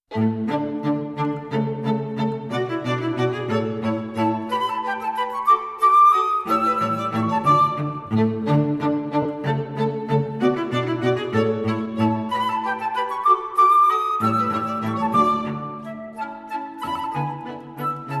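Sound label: flute quartet; krommer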